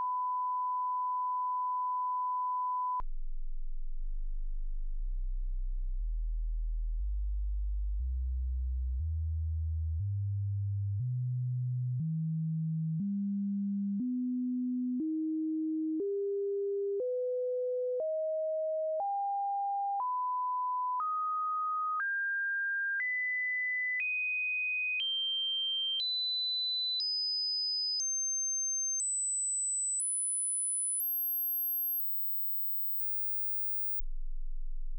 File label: f sweep